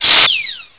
AIR

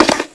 Napalm Mortar; Army Men TiS

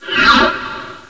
Space Swish; Let's Discover Michigan!

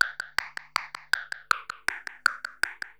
DRUM LOOP ambient 160

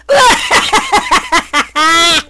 PAD; JK2; padstation; paddys

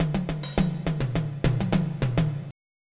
tom loops; flstudio502

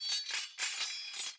sword

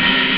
ride, drums

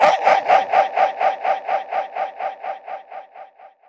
Fx; E-Lab; e-LAB Demo Samples; R Fx3 XGoldmine4 eLAB

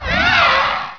dragon; Dragons

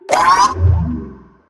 incubator birth; darwinia demo

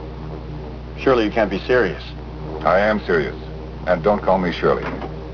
air

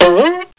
Boink With A Bite

